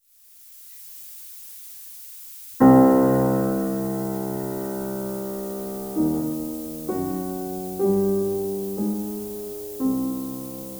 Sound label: noise shaped piano